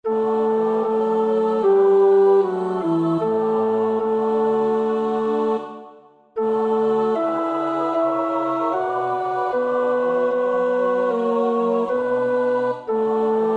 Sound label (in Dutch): tenor, koorpartij; Bach (Johann Sebastiaan); BWV 133